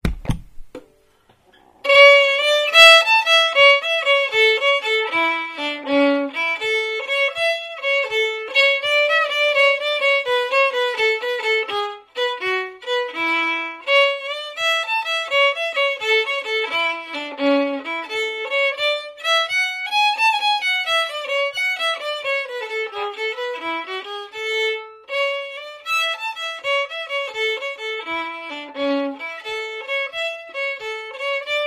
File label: Hornpipe slow; Sunshine